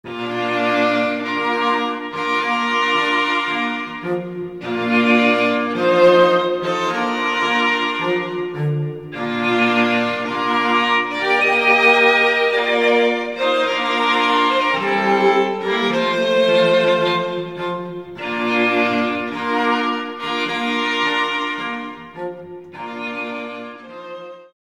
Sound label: Wedding March